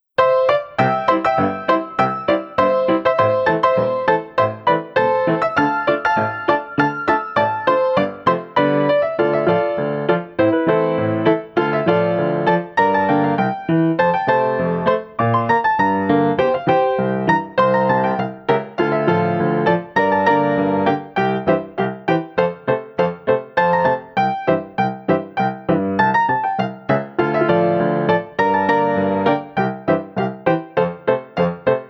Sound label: Instrumental